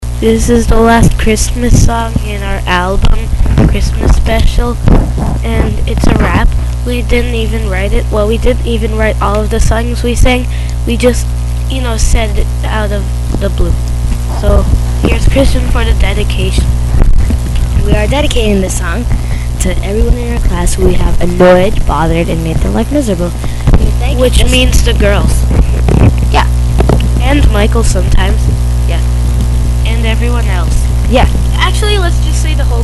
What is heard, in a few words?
christmas rap